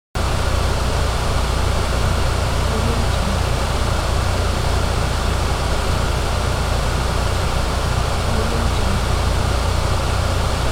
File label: factory atmosphere loop